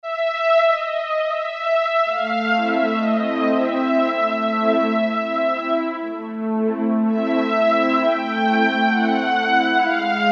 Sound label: SoftString, Korg MSC-4S Orchestra; Orchestra 1